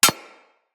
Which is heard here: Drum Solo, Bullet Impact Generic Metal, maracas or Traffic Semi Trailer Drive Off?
Bullet Impact Generic Metal